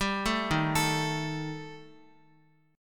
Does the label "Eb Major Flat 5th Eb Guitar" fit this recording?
Yes